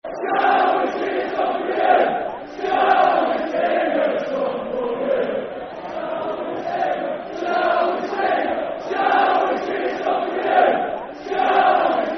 Shall We Sing A Song For You Football Chant (Soccer Song); Bradford City Bcfc